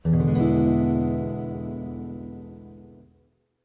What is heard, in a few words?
e dominant 7th